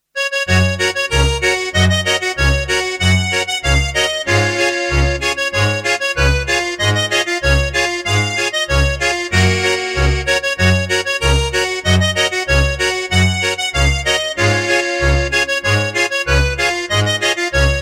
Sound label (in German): Hinterbrand Schottisch